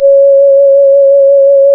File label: C#, Synclav FM, WIND; Big Fish Audio